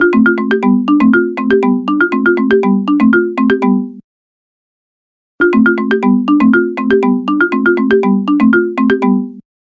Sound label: marimbas, wiko y61